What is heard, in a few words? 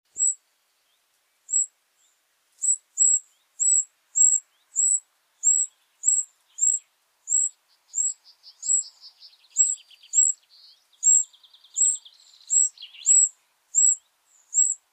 Troglodytes troglodytes; SETE